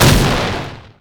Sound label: buster shot; rocket tristana